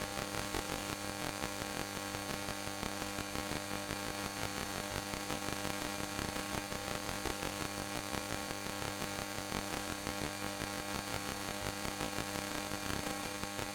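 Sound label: Ambience Static Loop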